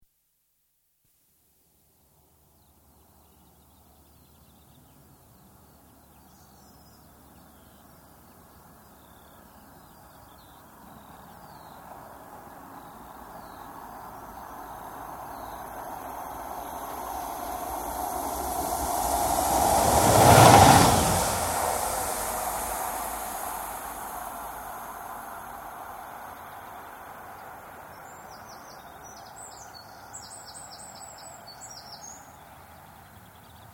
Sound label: Single Diesel Locomotive passes under bridge left to right; Train; BBC